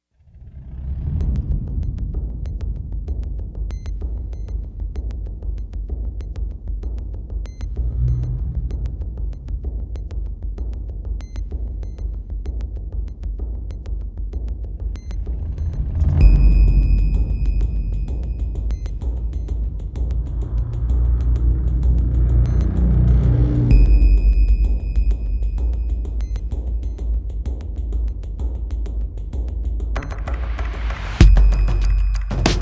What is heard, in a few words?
no synths